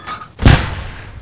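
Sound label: Mortar Sound; Close Combat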